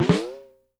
OOPS, PROC SNRS; Sampleheads; New York City Drumworks VOL-1

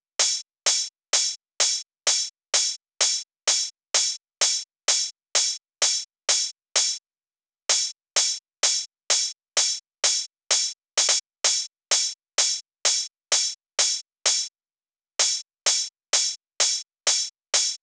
Drum Loop 128BPM OH; VEC5; Drumloops; Multi Loops

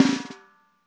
LOFI, LO-FI KITS; Spectrasonics; Burning Grooves CD2

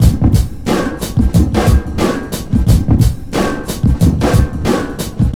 TRIP 90, trip loop

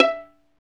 STR VL PIZ, Chamber Pizz, String Master Series; Roland - String Master Series

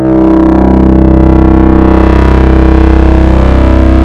MATRIX SWELL, TWAV BASS; Soundengine - CSR-000 The Art of Transwaves

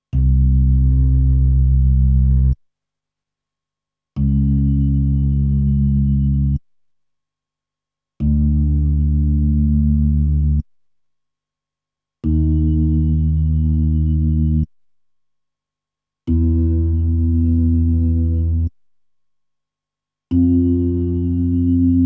B3 Organ; Masterbits CLIMAX 8

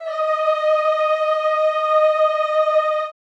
CHO, Roland; Sound & Vision; Gigapack I CD 2; CHO Zzzz + Vvvv; CHO Vvvvv

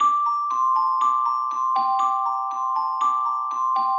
Ferric Mallets, 120bpm, A

